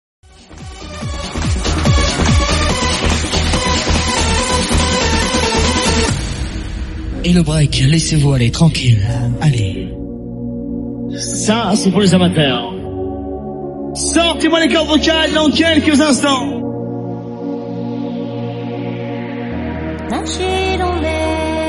Musique de Forain et de Discothèque, Compilation Disco; la Marlière

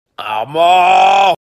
qui crie; Booba

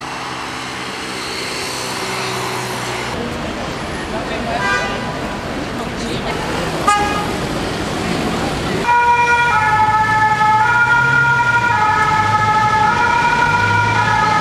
Bruits

